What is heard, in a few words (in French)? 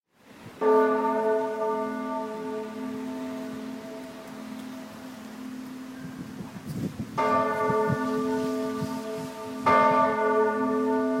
sonneur de cloches; St-Brieuc st michel